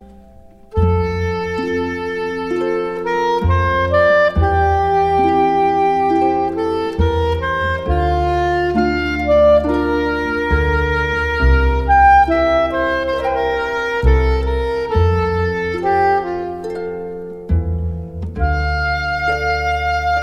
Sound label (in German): sopransaxophon; ich steh mit einem fuss im grabe